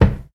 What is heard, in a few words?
natural bass drum sound D sharp key, Royality free kickdrum sound tuned to the D# note